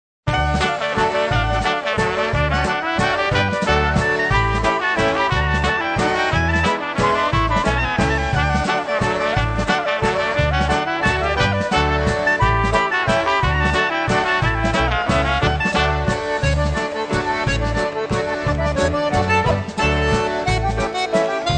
mazurka

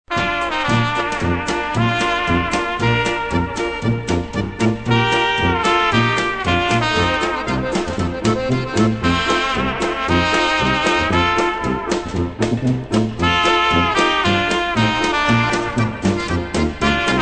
POLKA

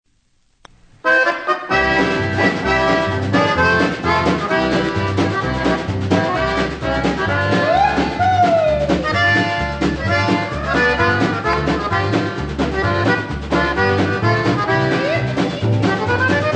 BEER POLKA

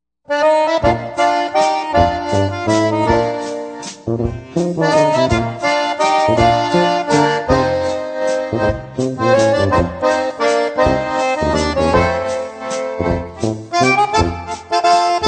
waltz

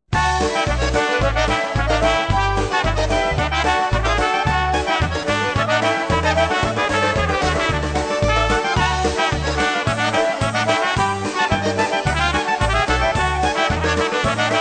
Polish vocal

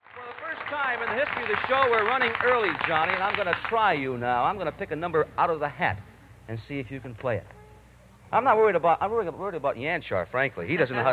Slovenian Home Polka

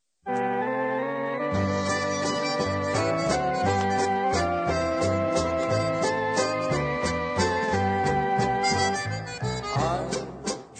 waltz

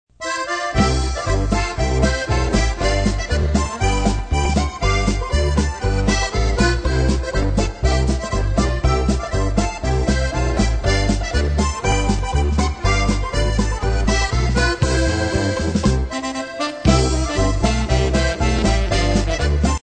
polka

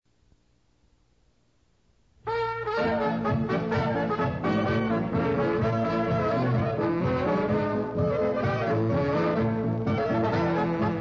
PILSEN POLKA

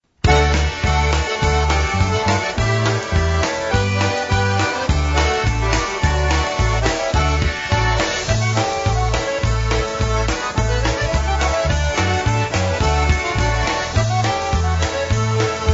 WHITE TABLE POLKA

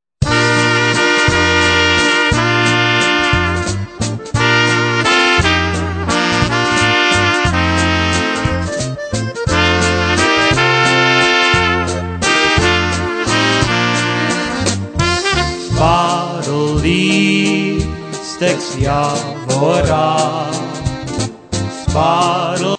WALTZ

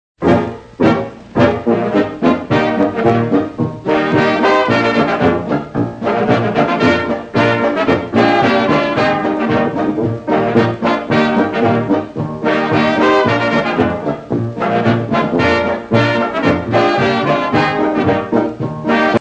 BOHEMIAN FOREST POLKA

